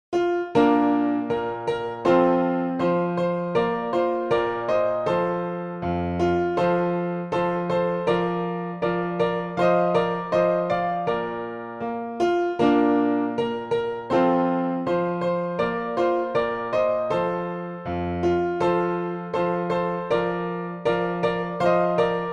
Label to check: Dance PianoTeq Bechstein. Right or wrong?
Right